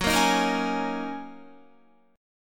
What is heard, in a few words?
Gb 6th Add 9th Gb down Guitar; Listen to Gb6add9 strummed